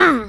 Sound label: pain; unit00